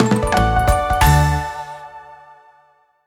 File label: medium Win; fruit shop